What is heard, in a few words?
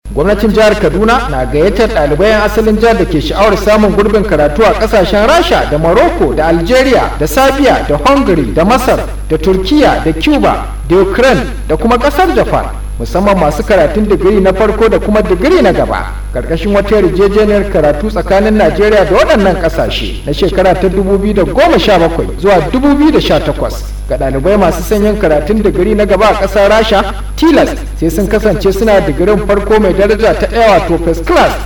HAUSA JINGLE